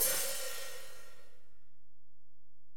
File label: HiHat, Alesis DM-PRO